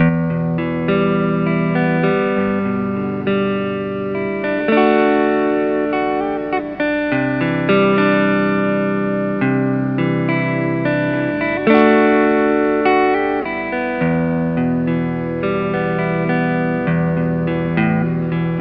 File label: guitar, Loops